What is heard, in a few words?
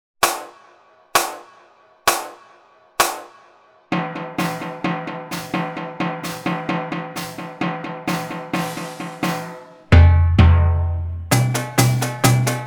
shake down, junior drumline